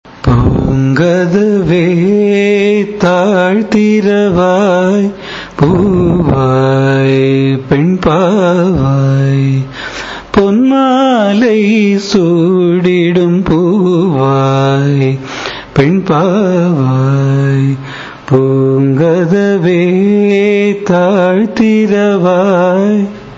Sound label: raga mayamalavagowlai, carnatic music